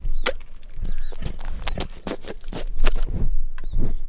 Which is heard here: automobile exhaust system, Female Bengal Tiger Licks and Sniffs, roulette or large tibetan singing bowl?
Female Bengal Tiger Licks and Sniffs